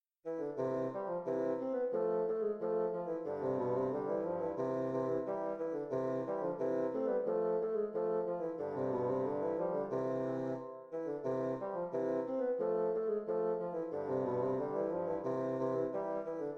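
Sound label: duo bassoon duet; heberle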